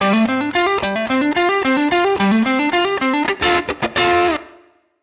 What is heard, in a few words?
Guitar Line, hiphop; musicradar